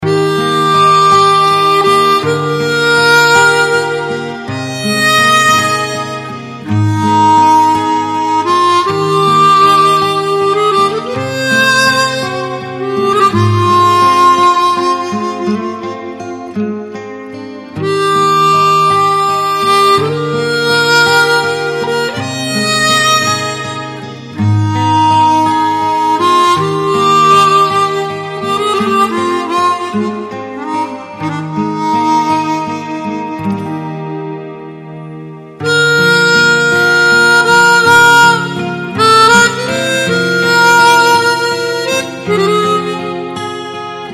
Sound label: Ring Saz Dahani